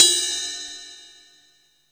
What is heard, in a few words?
CUP RIDE, CYMBALS